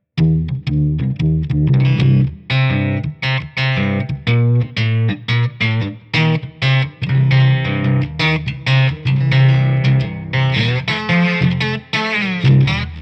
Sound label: Slide Guitar, Variax 700